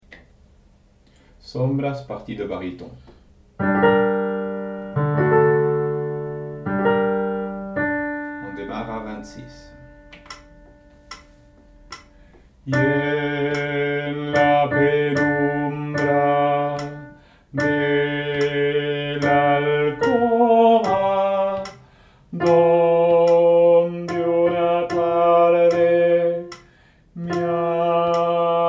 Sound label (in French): Baryton